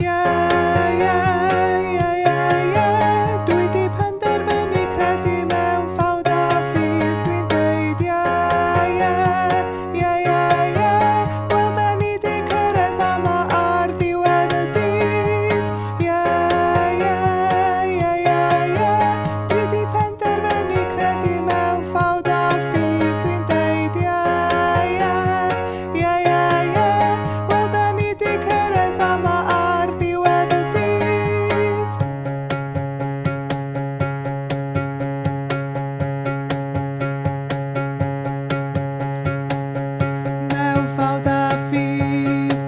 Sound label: Alto